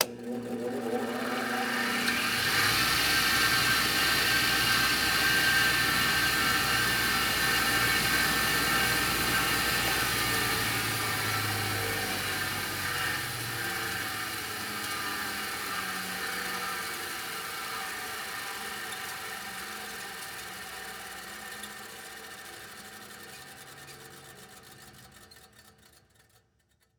Industry Tool Grinder Bench Grinder Turn On And Off